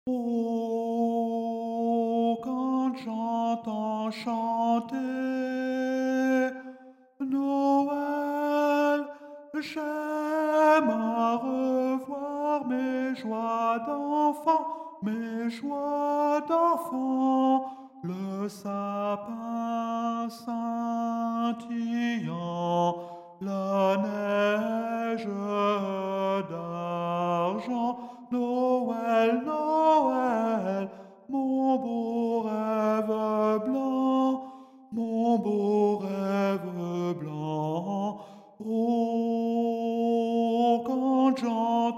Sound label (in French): noel tenor